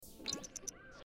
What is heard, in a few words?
fastforward; sims 4